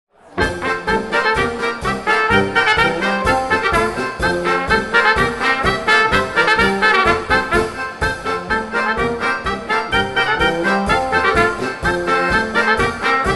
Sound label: Polka live